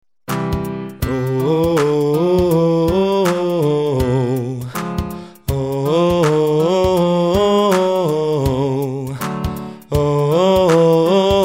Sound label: Exercise mixed 5 tone scale